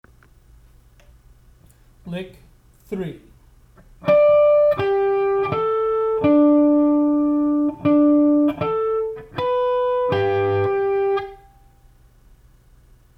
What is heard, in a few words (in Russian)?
Naturalnye flazholety; uprazhneniya